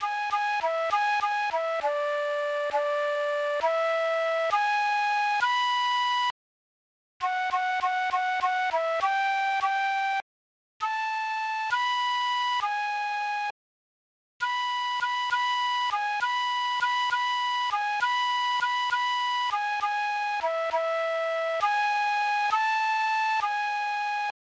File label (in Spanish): flauta